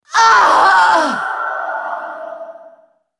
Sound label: dying; BlackIceAnivia